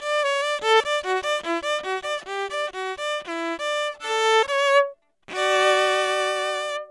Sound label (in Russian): skripka